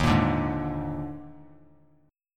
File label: Eb Major 7th Suspended 4th Eb; strummed; EbM7sus4